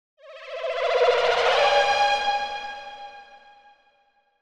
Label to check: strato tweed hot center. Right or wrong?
Wrong